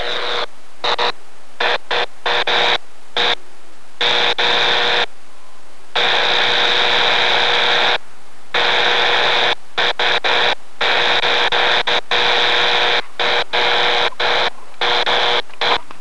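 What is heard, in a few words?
Powerline noise Receiver speaker; AR8000; RFI; Recvr speaker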